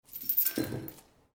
Footsteps Chain

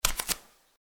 Footsteps Barefoot One

